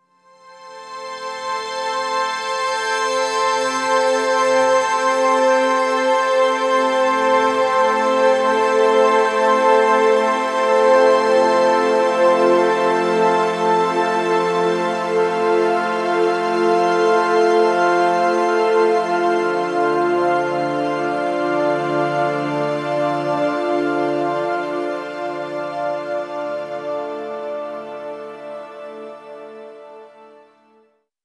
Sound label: Long Hi Complex, Complex Wave; Sonic Foundry; Sony Creative Software; James Johnson Spektral Minimalism